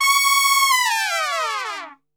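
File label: C#4, Brass Super Section, trumpet; Best Service; TRP LNGFLL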